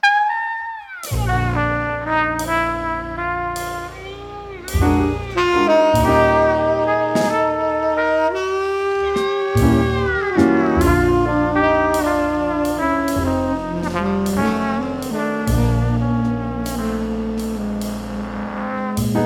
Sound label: quartet